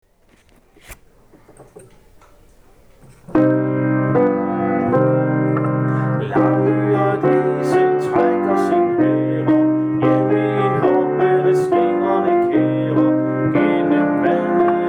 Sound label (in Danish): herrer + akkompagnement; Aeselsangen